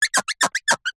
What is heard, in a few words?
cartoon clean rub squeak; zapsplat